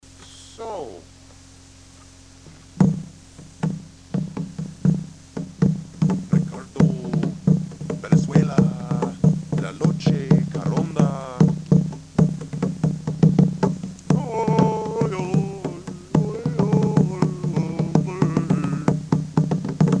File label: Rarely heard Latin American Song